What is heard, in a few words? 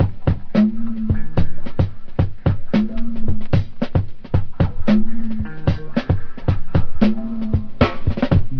Drum loops Funky